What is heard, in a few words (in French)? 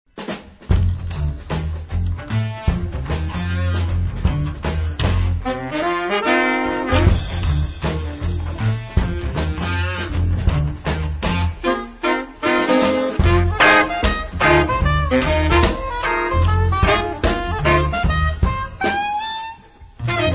sax et funk